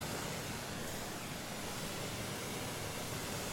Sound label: balloon on; free rider hd